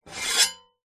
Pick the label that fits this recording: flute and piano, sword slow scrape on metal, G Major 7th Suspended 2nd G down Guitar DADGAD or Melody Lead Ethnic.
sword slow scrape on metal